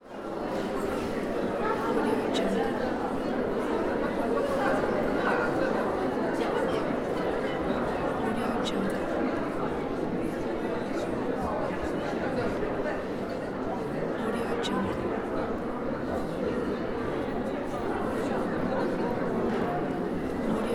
people in the hall

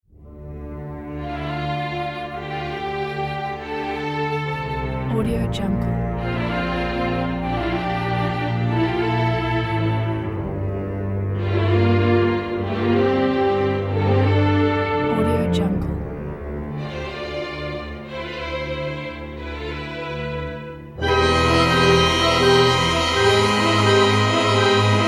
fight or flight dramatic movie theme; sound-ideas

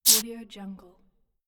soda bottle opening

